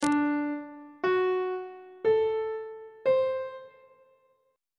d mixolydian 7th chord 1 is d dominant 7th on treble clef